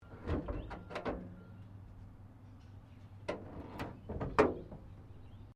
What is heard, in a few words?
Car Holden FE Bonnet Open; Car Holden Fe 1957; Cars; Vehicles